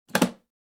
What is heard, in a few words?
briefcase lock